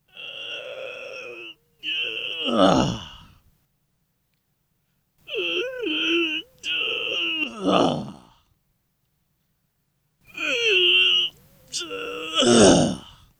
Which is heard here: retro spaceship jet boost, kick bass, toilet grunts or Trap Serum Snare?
toilet grunts